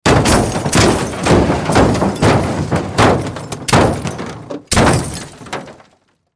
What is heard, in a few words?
Car Crash Roll Down Hill Impact Glass Metal Smash; Crashes; Sound Effects:Objects; Crash Metal Plastic Crash; Blastwave